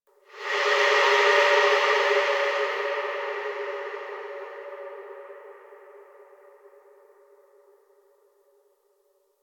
Texture Interior Medium, Ambience

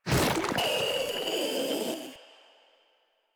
Infested Ancient Attack; Warframe; Ancient Ninety Nine; Proto Infested Ancient

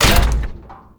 loot locker open; Warframe; Tau

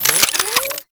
Modular Secondary Handle Slow Reload, Guns; SU Modular Guns; Reloads

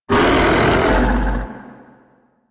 Octopede Pain; Warframe

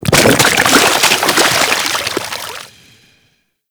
Shipyards Sludge Burst; Ambience; Props; Warframe; Grineer Shipyards

